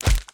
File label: axe meat; factorio; Factorio 0.14.18